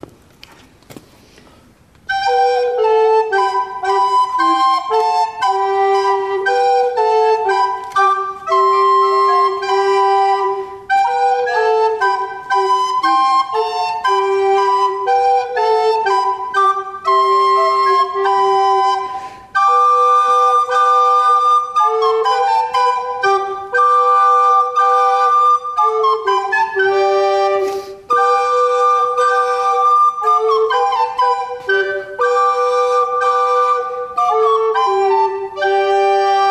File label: Gavotte